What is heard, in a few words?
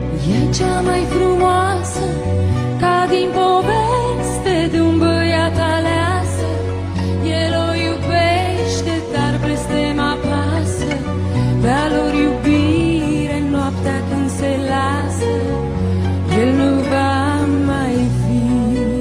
tango